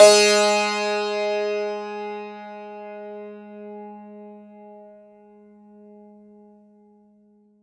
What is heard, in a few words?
STR, SANTOOR